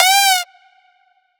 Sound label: Synth Shot, F